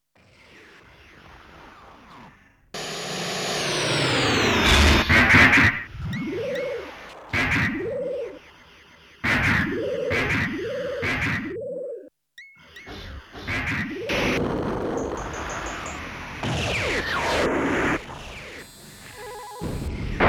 Sound Effects

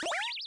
Jump

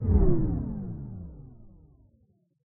Power Down

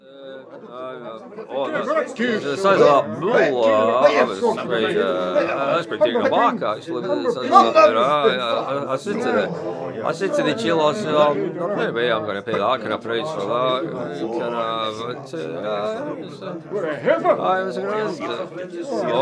Crowd Chat Train; The 39 Steps

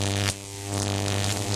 Electric Bzz; Smashy Road Arena android ios 2016

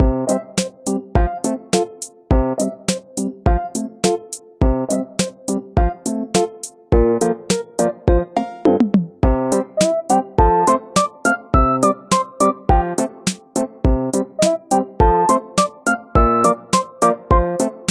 City Tour Exploring 104 4 beats; Brix

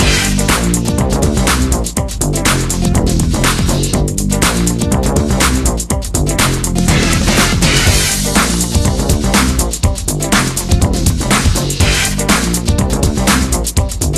Speedrun Background Music; Pixel Gun 3D

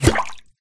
bullet impact water; Poppy Playtime; SFX Environment ProSoundCollection v1 3 MONO Guns Weapons

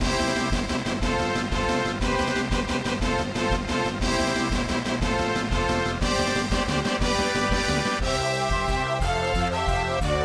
Trophy Win; mario tennis; n64

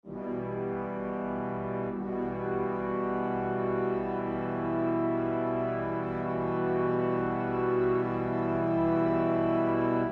Music Loop 32 Bars; Agent A A Puzzle in Disguise; Windows

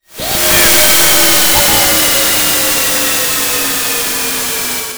The Machine Explodes; Dr. Robotnik's Mean Bean Machine; Genesis

